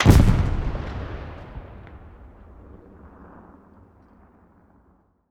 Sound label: explosions; gmod; lvs framework